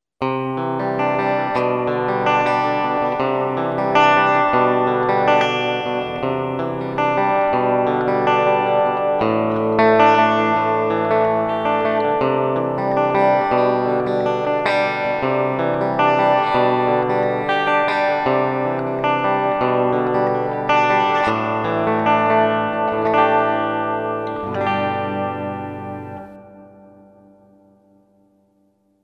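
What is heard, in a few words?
clean hall, guitar; Clean guitar with Hall reverb; GD101X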